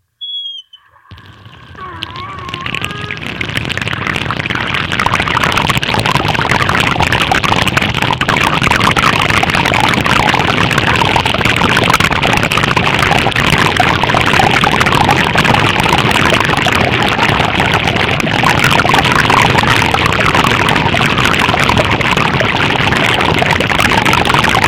noisy tap running water

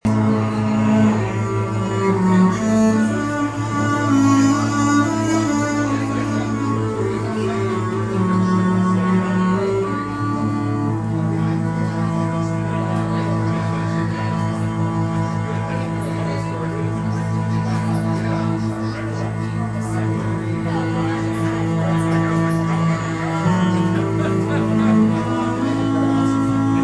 some kinda cello

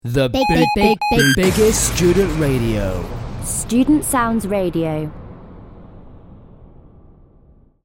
jingle with fx; student sound

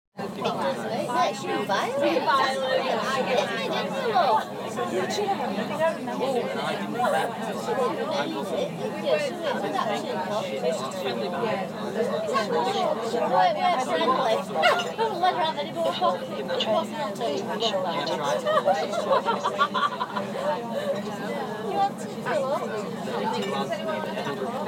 sound of the quiet coach

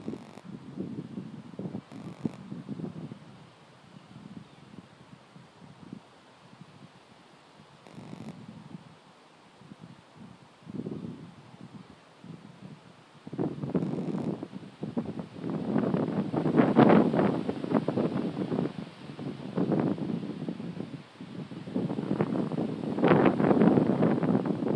wind in the woods

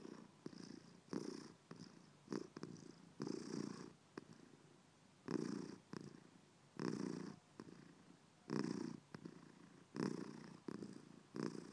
purry

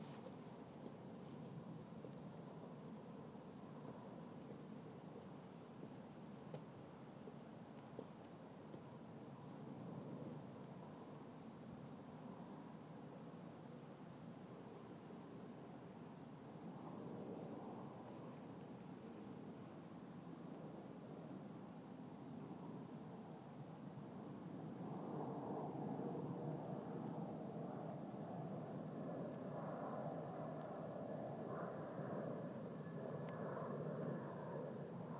London skyline hill view ambience

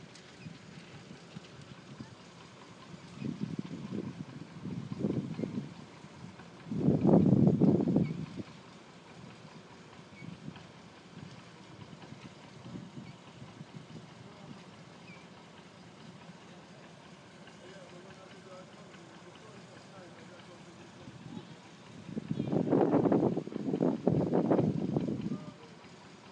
barges bunting baby birds

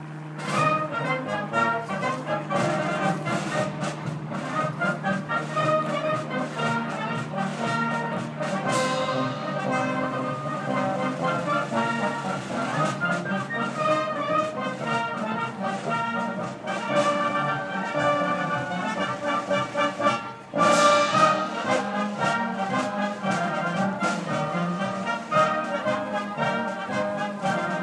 symphony orchestra; umd

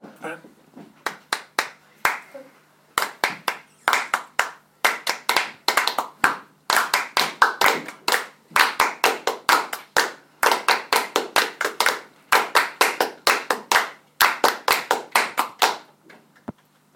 rhythm grid

